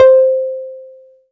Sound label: Yamaha TX81Z Harp C5